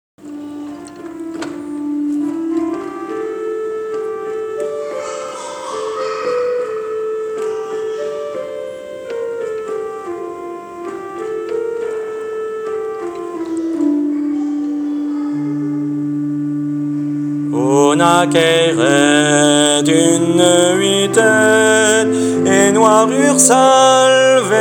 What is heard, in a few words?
Vocal